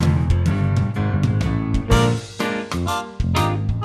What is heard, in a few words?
instructional intro